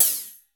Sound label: ELEC.DRUMS; Crypton - DrumBase5000